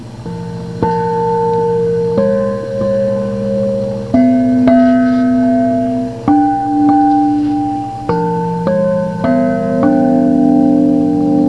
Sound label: gongs